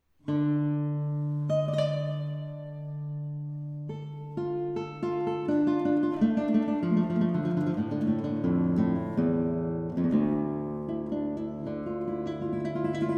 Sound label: Fantasia, Guit Solo; Prologo; L'Eraclito amoroso